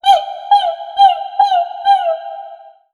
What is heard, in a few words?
monster; MonsterVoiceお手軽パック